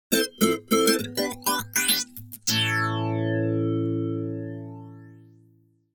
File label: Acoustic Guitar - Happy Rhythm - Flange; Hollywood Edge; sound designer's toolkit v2